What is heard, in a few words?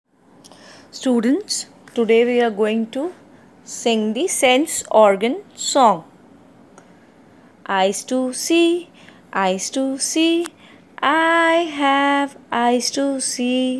Sense organ song; UKG